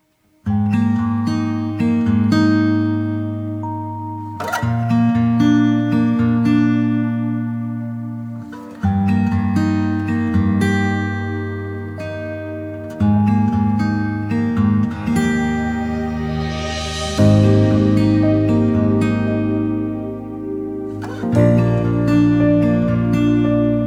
TV Score Acoustic; Der Lehrer; RTL

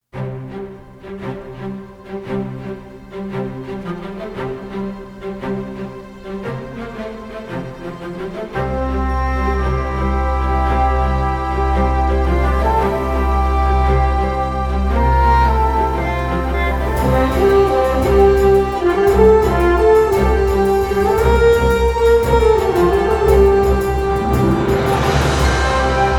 Theme Orchestral

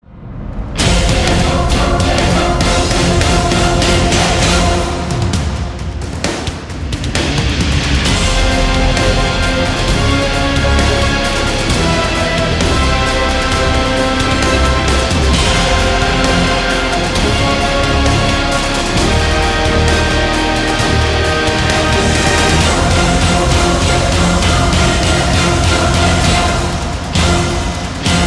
TRAILER EPIC

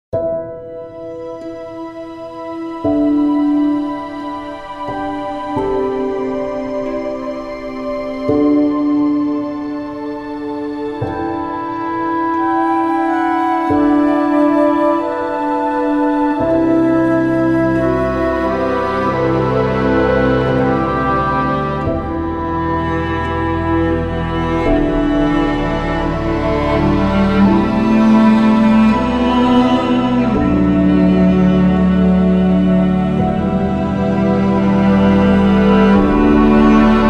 ORCHESTRAL

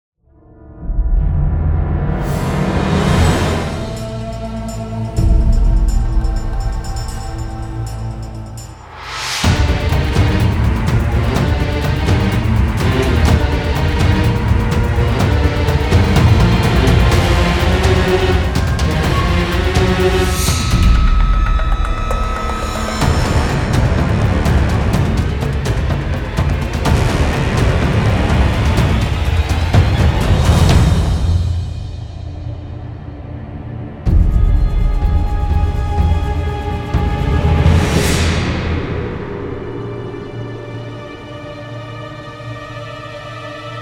Cinematic Hybrid Orchestral; Mortal Kombat X

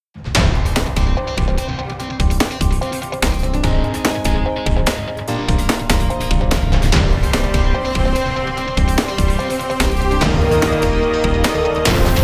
News Jingle

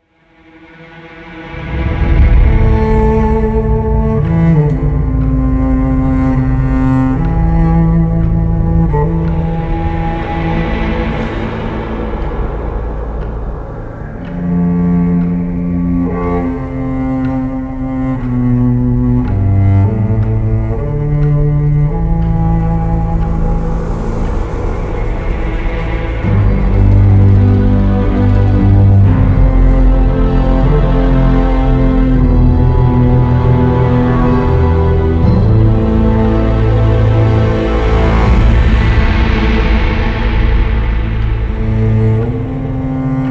Campaign Orchestral; Sonuscore Demo

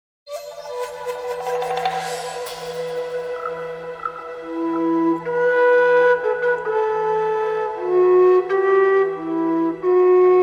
Campaign Solo Instruments; Total War Attila

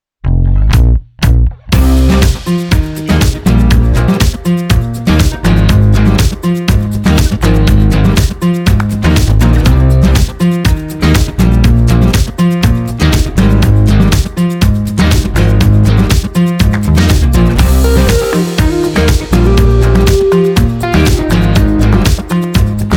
Straight Score; Der Lehrer

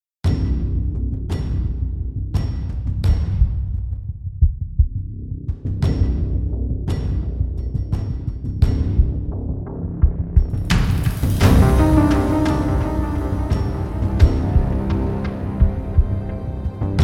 Opener Synth Drums Action Strikes

